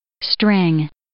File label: string